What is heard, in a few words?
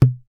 Dholak; Roland BK-5; Percussion